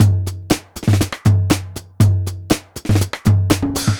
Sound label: Beats